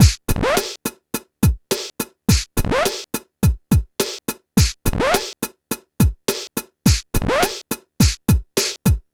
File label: PHATT BEA, Hip Hop